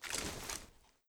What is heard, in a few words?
whoosh; cw20 extras